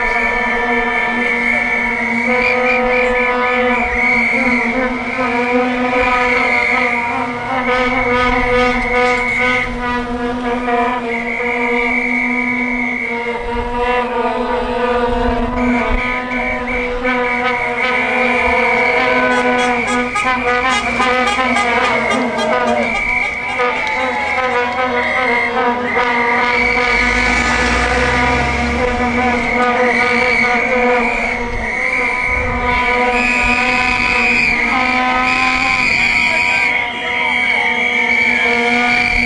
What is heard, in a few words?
vuvuzelas at protest outside fire brigade hq; union street